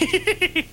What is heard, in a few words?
laugh; rachel ray